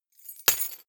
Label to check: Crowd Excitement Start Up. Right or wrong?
Wrong